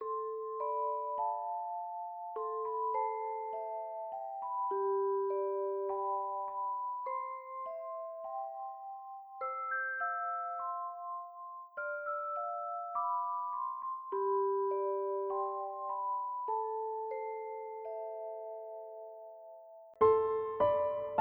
Piano Music

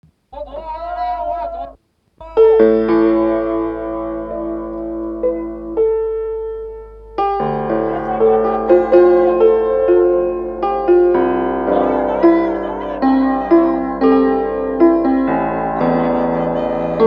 Inside the piano

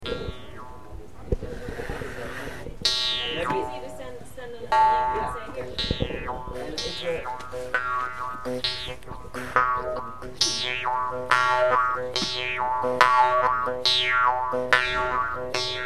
Morchang, Field Recordings from India; WFMU

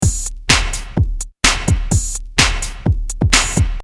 127 beat; Loop Mania Sampler Pack

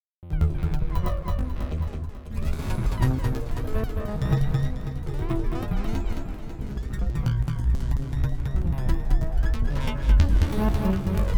kick explorations

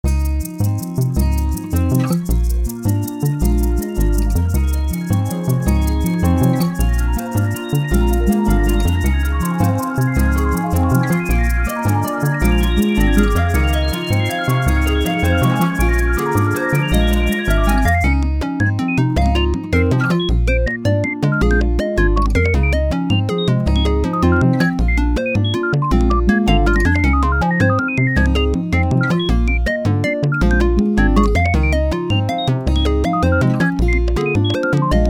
Robot Anthem Part II

